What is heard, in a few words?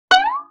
Violin Pluck Slide; Vintage Cartoon; Musical Elements